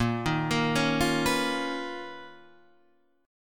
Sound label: B Major Sharp 11th A sharp